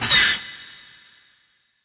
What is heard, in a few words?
Wind dmg; WindLence